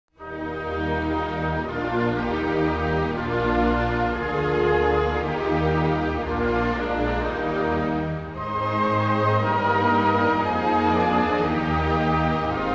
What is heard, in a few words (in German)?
Deutschlandlied